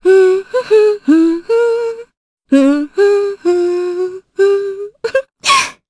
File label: Hum; heroes; Veronica; kingsraid